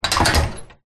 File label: household stove wood burner metal door close